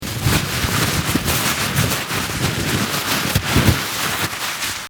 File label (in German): bad toilettenpapier